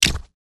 brick no connect; build mode

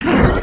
idle, creatures organic

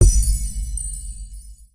start chimes; aronwk collection; ndaudio

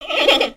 Pets Bear Panda Happy; creatures; Lego

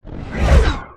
asteroid whoosh by

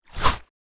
color window; build mode

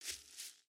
Foot Grass Walk, footsteps

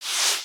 Text Outgoing; aronwk collection; ndaudio

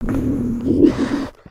Stun; Skullkin; creatures organic

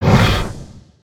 Pet Dragon Idle; creatures pets

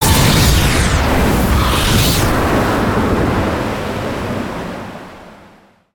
Siege Tower Impact; destructibles

